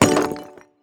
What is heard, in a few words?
Spreadable Hit; Ellen's Garden Restoration; android